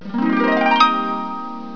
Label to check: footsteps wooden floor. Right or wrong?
Wrong